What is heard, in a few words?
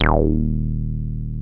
303 REZ EB1, ROLAND TB303; Big Fish Audio; First Call Vintage Keyboards